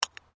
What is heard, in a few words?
Digging Small Handheld Tool Rocks